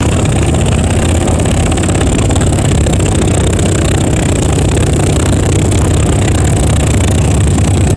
propeller airplane engine revving up; aircraft

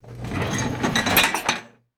loaded dishwasher rack pulled out; drawers

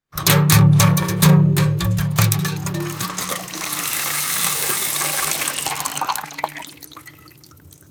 liquid pouring from a metal jerry can; foley

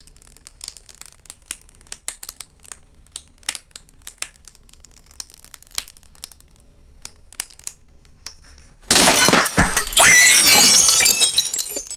glass stressing cracking and shattering

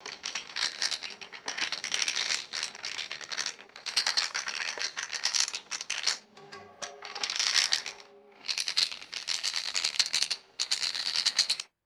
mahjong tiles shuffling on a wooden table; game

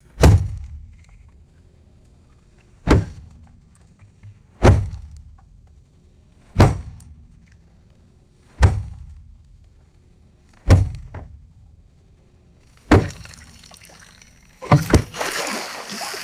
dull thuds on wood in water

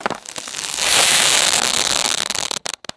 rapid crackling firework spark burst

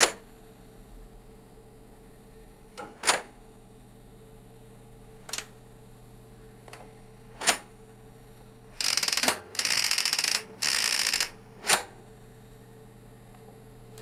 mechanical clock ratcheting and clicking

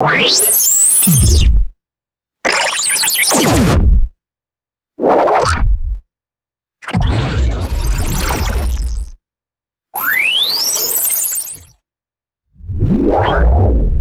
synthesized filtered cartoon power up sweeps

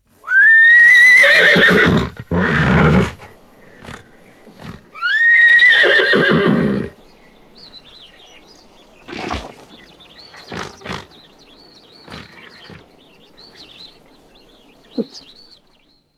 shetland pony whinnying outdoors with birds; animals

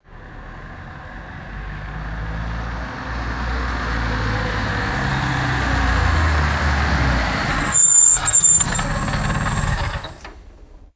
diesel truck approaches stops and shuts off; vehicles

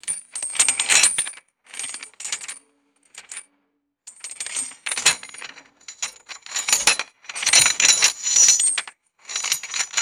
heavy metal chains clanking and shifting

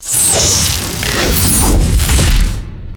synthetic electrical energy spell blast

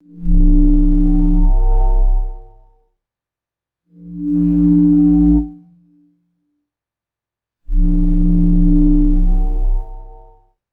harsh analog synth braam swells; designed

